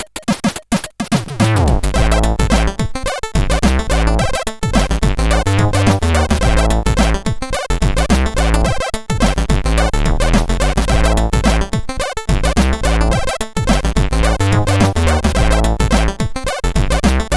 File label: Groove, sid CSG8580R5